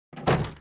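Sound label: toilet close; wc; Mafie 1 cz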